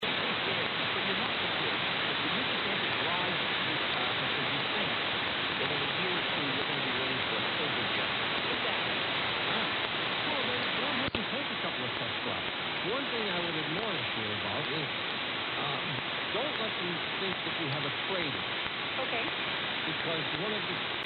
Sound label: AFN PowerNet 873khz